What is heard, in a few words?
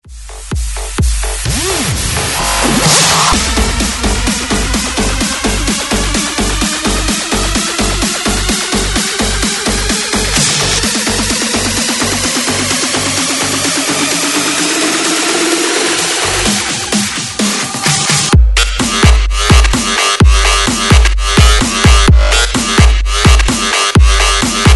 130Bpm BREAKMIX